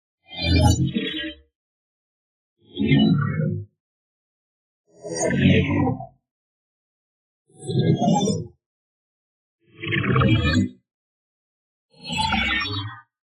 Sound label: ABILITY CHARGE Spectral Neutral Movements; boom library; Matter